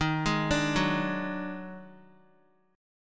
D sharp Minor Major 7th Double Flat 5th D sharp Guitar; arpeggiated